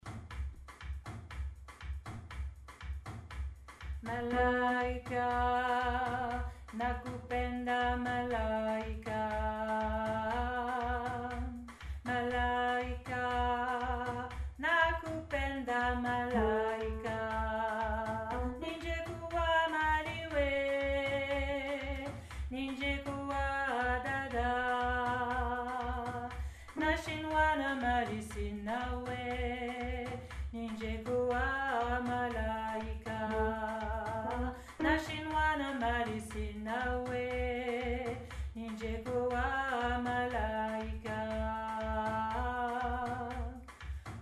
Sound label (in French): Alto, Choeur